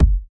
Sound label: kick